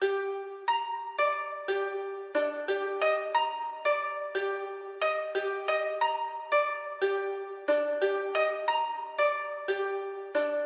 Ethnic Trap 90 Koto Gmin

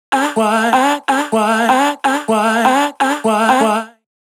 vocal loop dry 125bpm

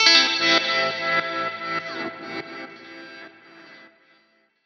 Am Tremolo Guitar Chord

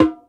Motown Bongo Hi

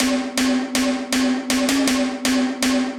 160 tom loop panned

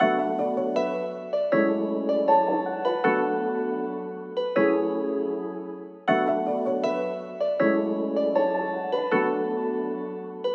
158 BPM A; Wavy

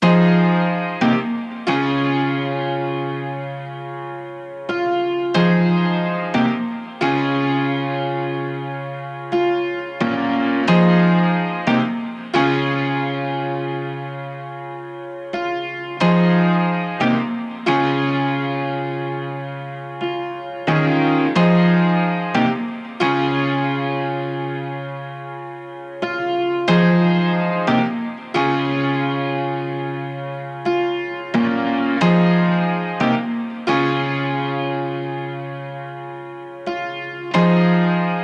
Piano

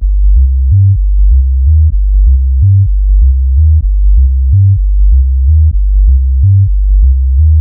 bass fun G 126

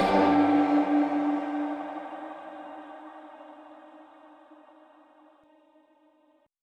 Airy Impact; Bloom

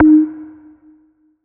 Lead, D4

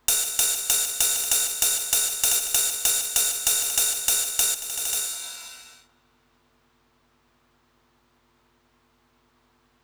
195 909 RIDE CYMBAL; MACKIE

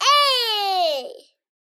vocal baby aye

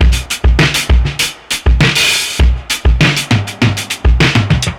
Break Fill 100BPM

